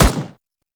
Lasrian APC Turret First Shot; Warframe; weapons

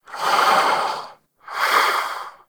Vocal Breathe; Warframe; Duviri; Dax

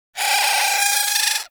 Avichaea Vocal Captured Idle; Hunting Critters

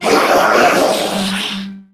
Death; Warframe; Corpus Amalgam Sniper; Dialog Barks Battle

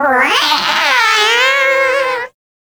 Kavat Vasca Player Lure Call, Animal Lure; Warframe; PoE Animal Lure

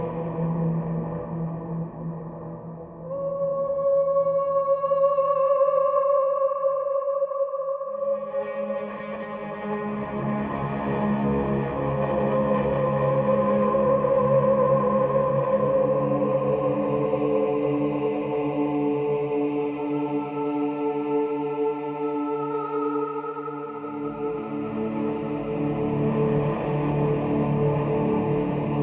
Caves Amb Pads, Music; Duviri

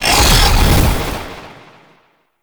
Boreal Teleport Out; Warframe; The New War; Archon; Abilities

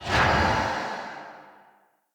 Circade Dodge Whoosh, Enemy Abilities

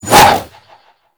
Arch Wing Sword Short Whoosh; Warframe

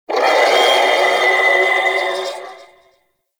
Sentient Symbilyst Attack, Enemies Barks; Warframe

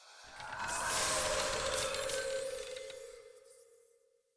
Ancestor Tree Activate; Soul Plane

